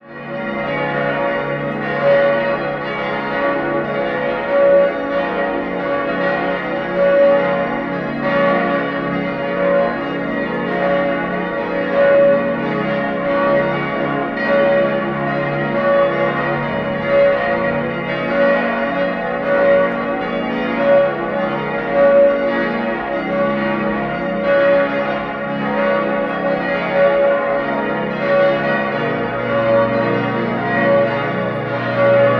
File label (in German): Domglocken; Dom zu Speyer; Gelaeut der Domglocken